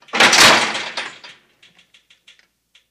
Gate Metallic Slam C

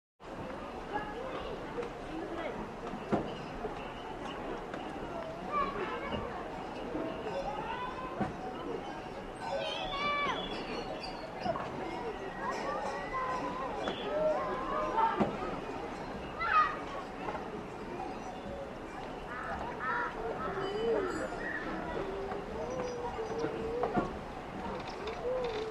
Namche Bazaar Nepal; nepalese

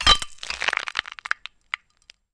Camera Bulb Glassy F, flash pops

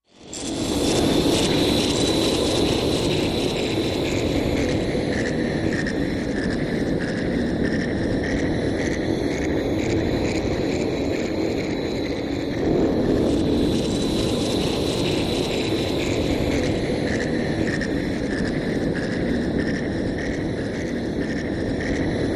Dark Forest Wind Eerie

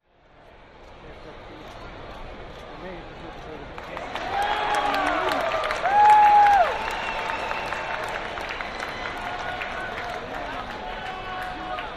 Medium Baseball Crow

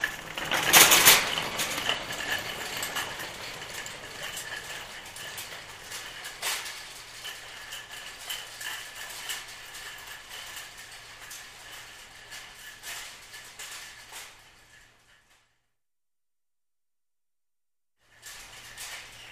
Shopping Cart Moveme; carts

